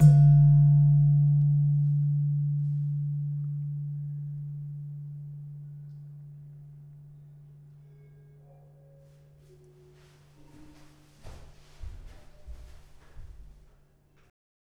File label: Slentem, gamelan; gravityharps; timbrebox